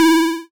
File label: Ym Multi Organ E1; multi-samples; CHIPSHOP DELUXE